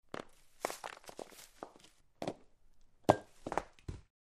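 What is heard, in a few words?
Ceramic Rock Movement, rocks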